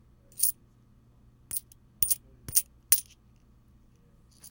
Coins

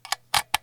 Plug In Jack

